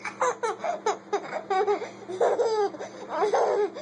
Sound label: laughing